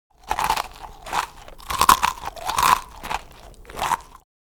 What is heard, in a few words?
Eating crunchy snacks mouth open